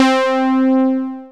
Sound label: HI POLY, SYNTH FUNK; Zero-G; Funky Elements